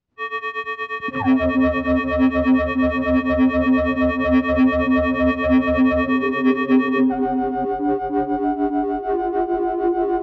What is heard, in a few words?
Bells